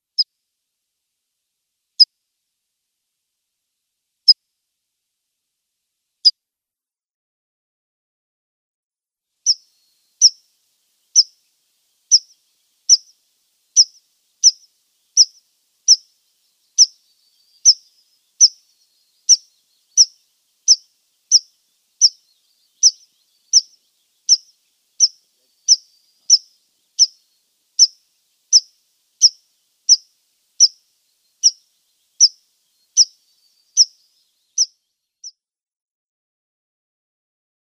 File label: Tree Pipit calls